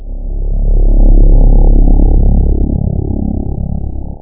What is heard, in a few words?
Techno Thunder; CephaloTron